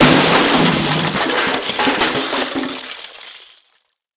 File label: metal break